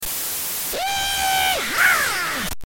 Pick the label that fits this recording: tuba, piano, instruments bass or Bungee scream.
Bungee scream